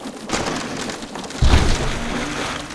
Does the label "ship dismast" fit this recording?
Yes